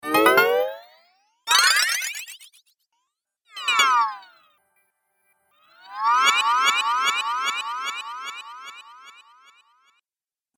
Cartoon Game Spell Collection, Magic

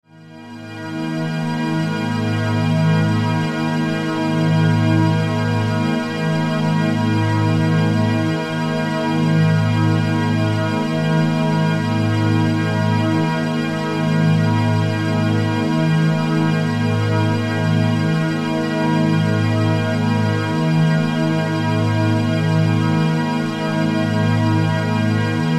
Bed Tonal Harmony Low, Bed Strings Wash; Bed Sound Effects; Blastwave; Production Element Imaging Element Accent Transition

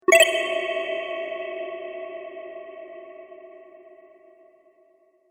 Game Cavern Tokens; Video Game Sound Effects

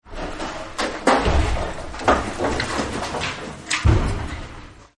Wood Splinter Crunch; Materials; Partners In Rhyme

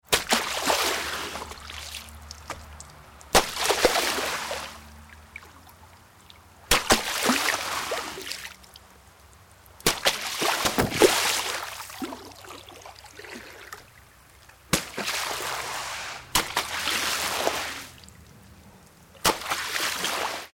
Splashes Various; Water; Tom Hutchings

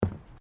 Footsteps Boots Bridge Wooden Step; Footstep Foley; Footsteps (Sound Effects:Human)

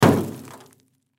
Snowball Throw Car Hood; Ice; Weather; Ice Sound Effects; Blastwave

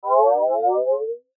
Swell Swirl, Electrical, Swell, Power, Surge; Multimedia; Production Element, Imaging Element, Accent, Transition; Sound Design; Blastwave

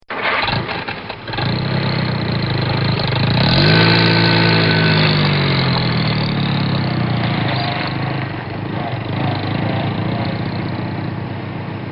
Dodge Stealth Start Pull Away; Transportation

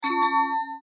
Chime Multimedia; Web Interactive; Chimes (Sound Effects:Multimedia); Blastwave; Technology

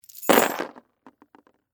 Game Pieces, Drop, On, Board; Sports, Board, Game, Metal, Pieces, Drop, On, Game, Board, Monopoly; Board Game Sound Effects

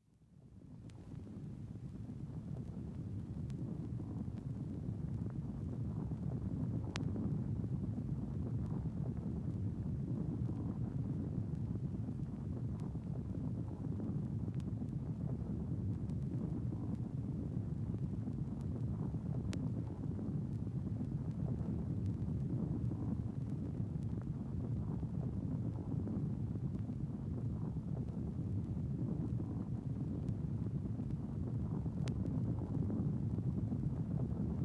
Earthquake

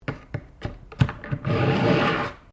KNIFE SHARPENER USE; Various knives; Kitchen (Sound Effects:Household)

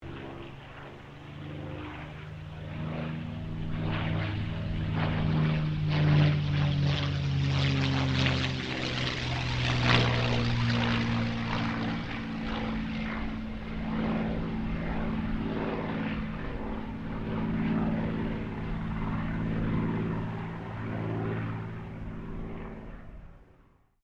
AIRCRAFT CA-18 MUSTANG PASS; P51 /CAC-18 Mustang; Airplanes; Vehicles

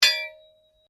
Blade Heavy Moving Impact Ring, Sword; Foley sounds for melee weapons; Swords; Combat; SoundEffects-Foundation1

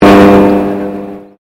Convolution Pulse

